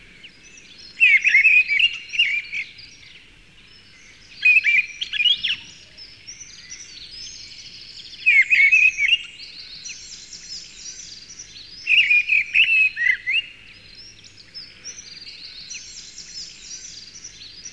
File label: Birds